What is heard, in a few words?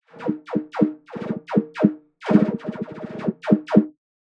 snare